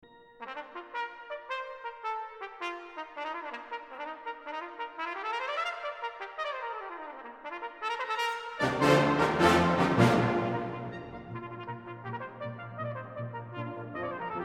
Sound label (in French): extrait cornet